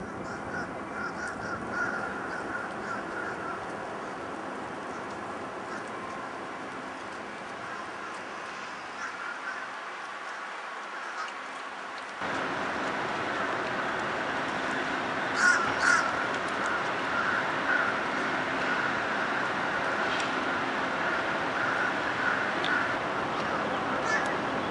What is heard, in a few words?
Eastern Phoebe